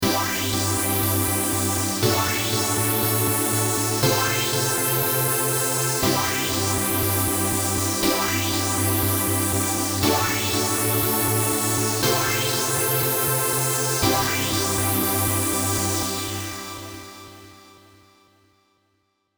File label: trancegate stereo